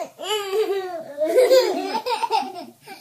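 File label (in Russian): otrazhenie ekrannoy magii, zvuki detskogo smekha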